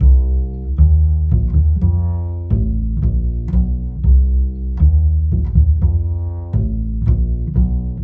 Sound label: bass